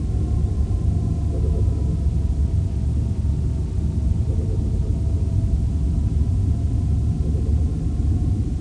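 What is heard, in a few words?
engine hum; startrek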